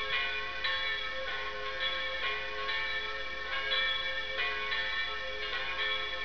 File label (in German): glocke kath kirche; gau weinheim; ortsgemeinde; bauwerke